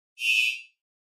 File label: Mouse Over Elect